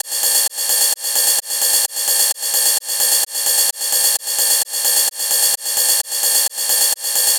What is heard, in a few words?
Ride Loop 130, Techno; Techno Sounds 2024; Rides